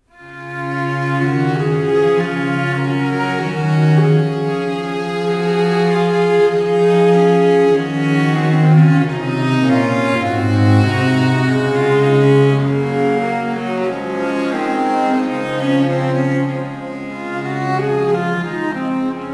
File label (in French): baryton; Orpheon